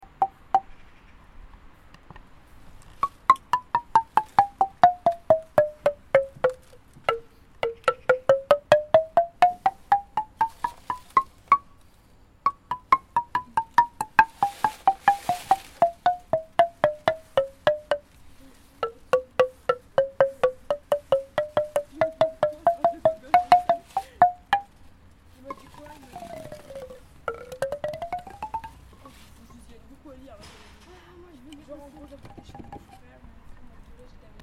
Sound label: playground sonore xylo; collectifetc